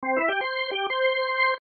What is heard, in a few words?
Music Organ Charge, Music Organ Charge Ascend; Keyboards; Musical; SoundEffects-Blastwave; Sports